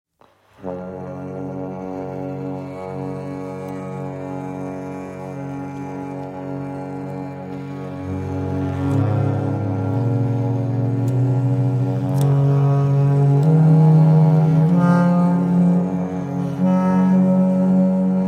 F Sharp Major Prelude

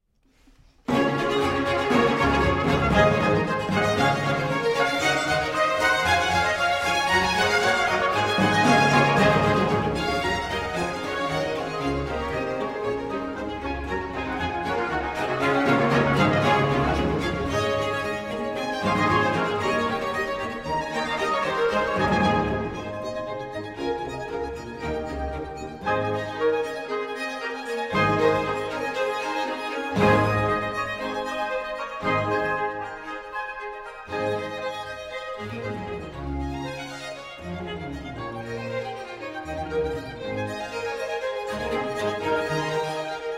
Sinfonia in D Major; BWV 1045

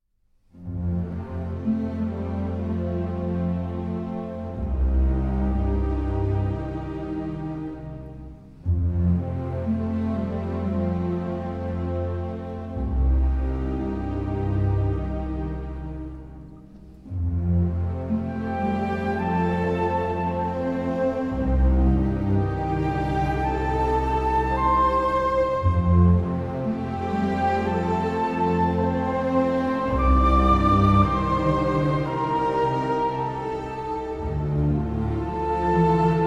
Chamber Orchestra, Lullabying

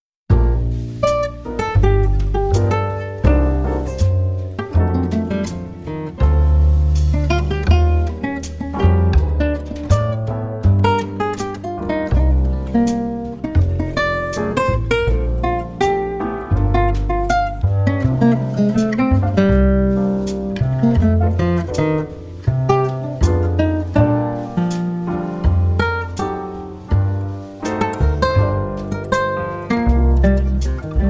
Relaxation Jazz Dinner

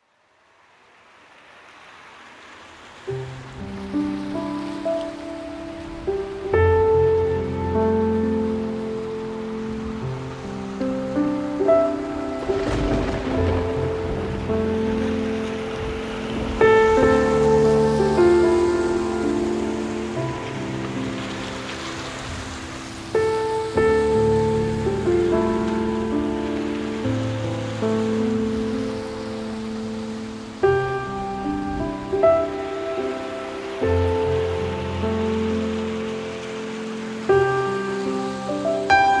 Dream Piano and Rain

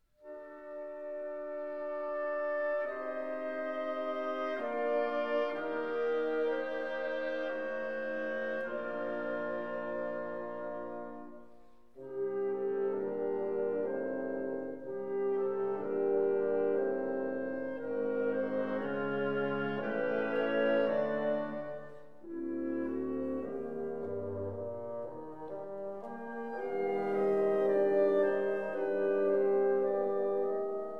Concerto in D Major for Violin and Orchestra

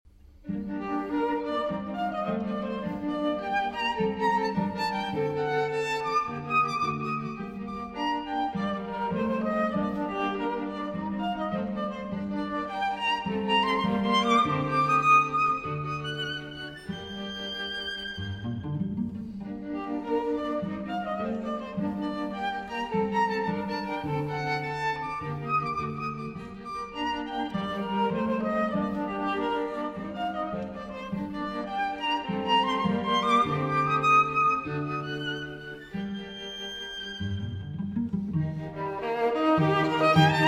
String Quartet in D Minor, II. Variation 1

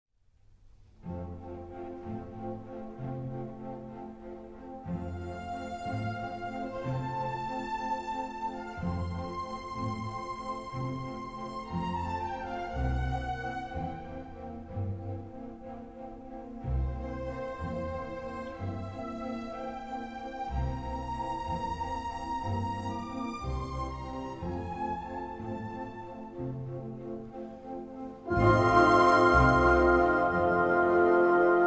Piano Concerto No. 21 in C Major II. Andante

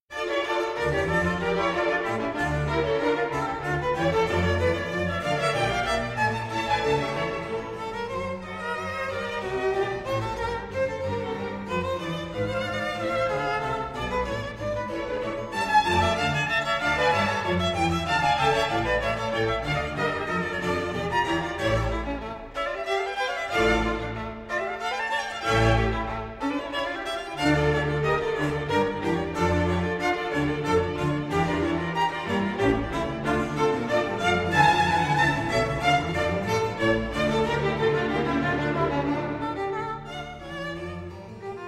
Concerto for Two Violins in D Minor, Allegro; BWV 1043

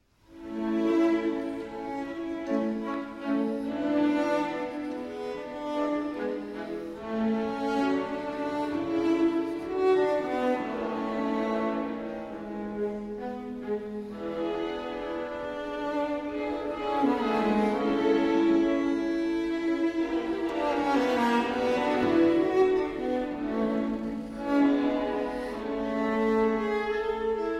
Cello Concerto No. 2 in D Major II. Adagio (Live)

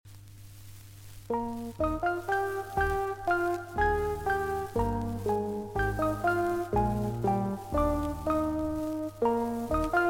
Chorale Prelude; Wachet Auf

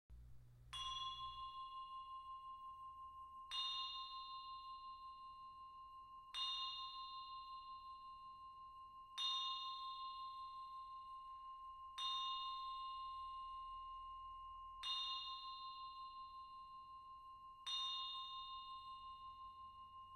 For Flute, Strings and Harpsichord

